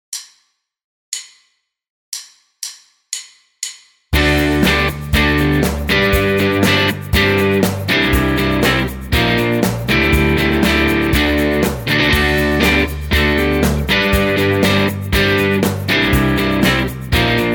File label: rhythm, guitar, open G tuning